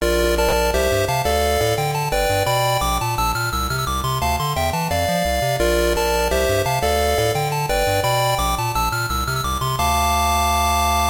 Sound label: square wave; Sistemes Digitals